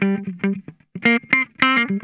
SKIN DEEP, Funk Guitar; 90 s Sample CDs; Zero G

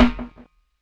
SNARE OFF, DRUM N BASS KIT; JUNGLE BEATS

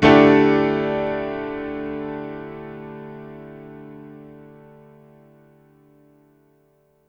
Piano stack (D) maj; Sonic Foundry (Sony Creative Software); Ugly Remnants VOL-2

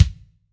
AM KICK, AMBIENCE KICK

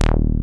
BASEMENT BASS; Masterbits; Dance Trax

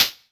Snares, Elektron MachineDrum SPS1 MKII

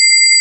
BELL WAVE

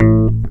CLAV BEL; USB Soundscan vol.32; Roots Disco; AKAI

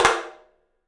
open tom; 3D Sound; 3D Drums

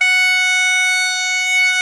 CHANTER, Bagpipes; E-MU Formula 4000 Series Vol. 4; Earth Tones

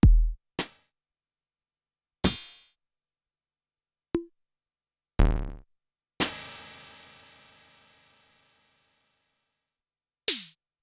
Dirt; Sonic-Potions LXR

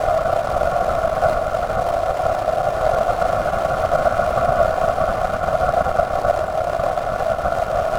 Drone Ambient; Drums & Drones

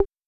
Conga Mi; AJK Percusyn; Drums

